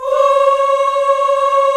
VOX African Ahs, African Aahs; Roland LCDP11 Africa VOL-1

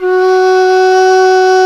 QU DI F#4, QI-DI FLUTE; Spectrasonics; Heart of Asia

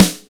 DECOY SNARE; POP-ROCK2

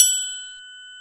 TRIANGL, PERCUSSION

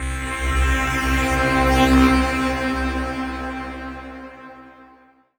BUZZSAW; AKAI Anime Sound FX

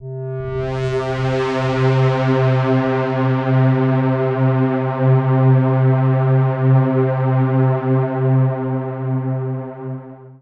Deep Sea C, Ambient; Trance Formation

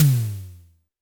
TD-20 Tom A, Roland TD-20 Toms

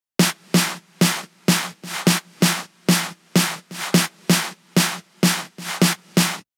Drum Loop 128BPM SN; VEC5 Drumloops; VEC5 Multi Loops 128BPM; Loop Elements

